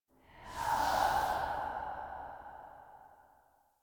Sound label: Tarot UI Open Screen; Warframe; Harrow; Tarot Emotion Choice